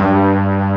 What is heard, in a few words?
BRASS G1; Big Fish Audio; Synth City